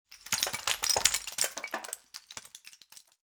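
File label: tripmine explosion debris; weapons; Half Life Alyx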